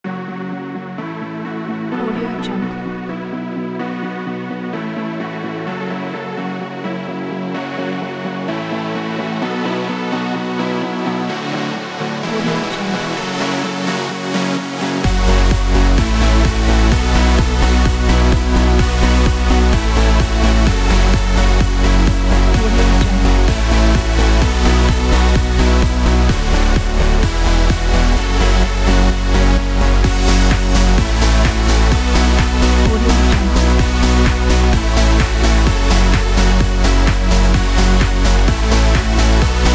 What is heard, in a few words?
trance intro